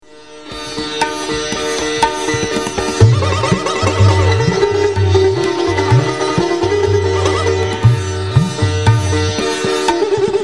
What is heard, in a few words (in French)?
inde benares raga